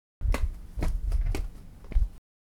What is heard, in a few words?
step; はだしで歩く２